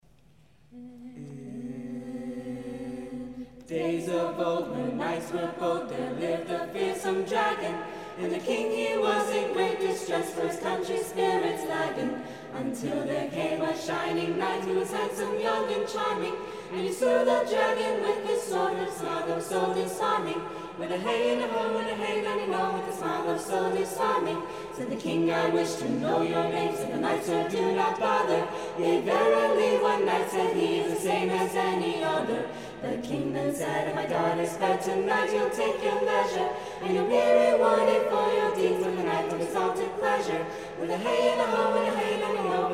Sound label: Madrigal Choir